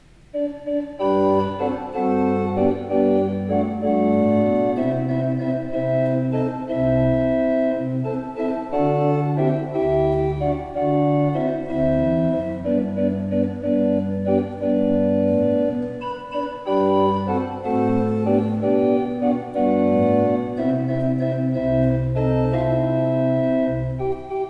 pipeorgans

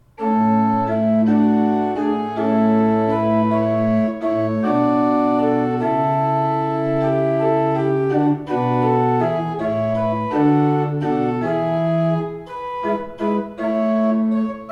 Baltes, pipe organ

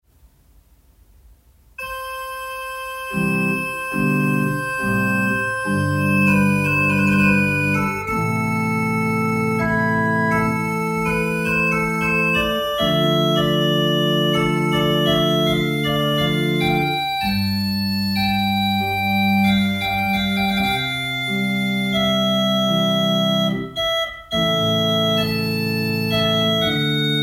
Mayer, pipe organ

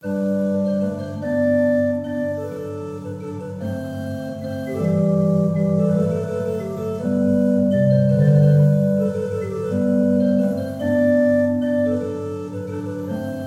pipe organs; Oehms

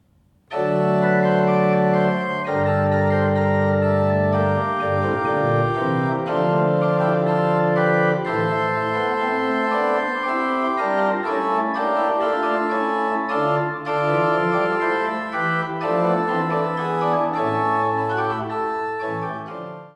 pipe organ; Le Raphael Multiplex

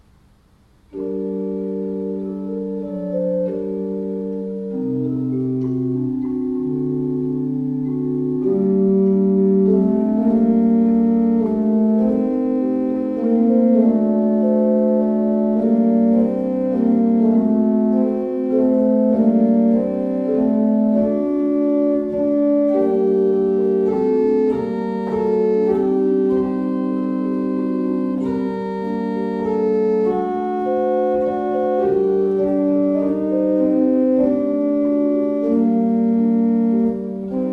Walcker, pipe organ

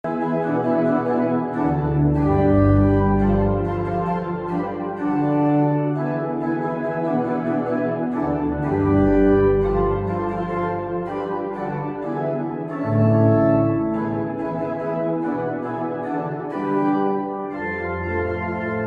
pipe organs

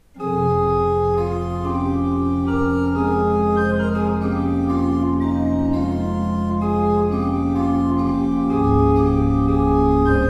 Ott, pipeorgans

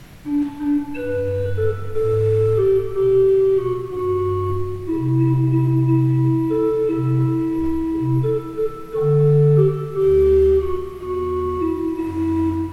pipeorgans

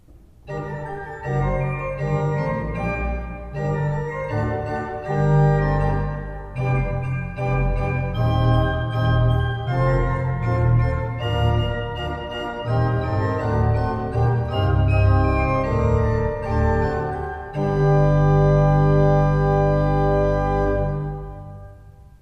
pipe organ

